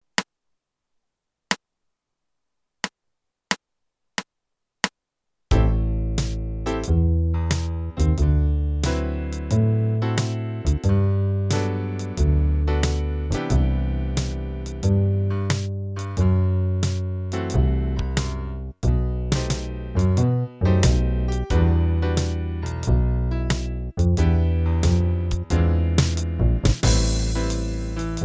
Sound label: 90 bpm; Jazz Repertoire